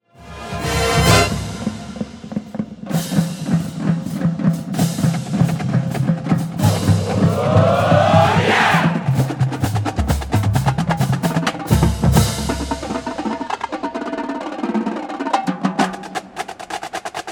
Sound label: Drum Feature, marching band